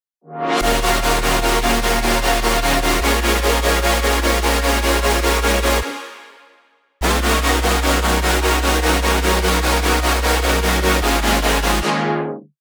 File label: Chord Loop, 150 BPM D# Min, Synth; Future Bass; Drop Loops; Heater